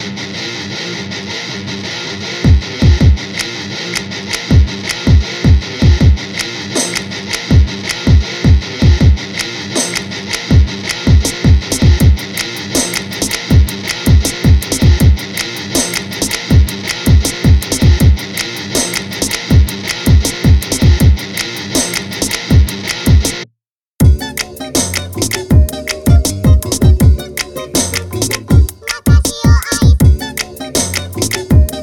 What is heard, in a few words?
Karaoke